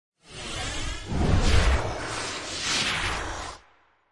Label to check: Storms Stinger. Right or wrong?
Right